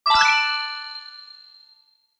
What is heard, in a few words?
Builder game power up item